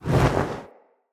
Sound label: Player Torch Whoosh Fire; Weapons; Blunt